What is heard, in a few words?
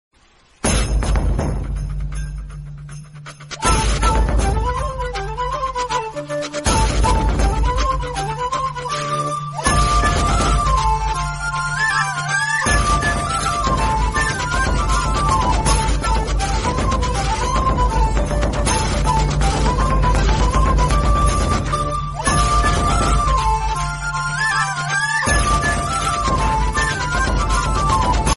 Tamil mobile ringtone